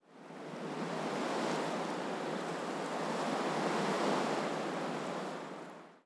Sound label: snowy gust; ambient soundscapes; dota